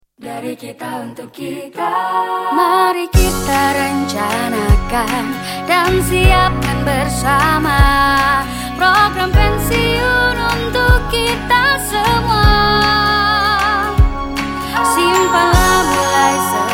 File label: Jingle